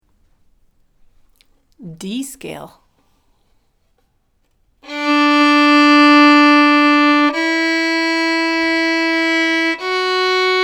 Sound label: Scales D Major